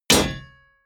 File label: clang medium